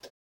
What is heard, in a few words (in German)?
blinker off, volvo 9900; Vehicles; AI volvo 9900; Freyfurt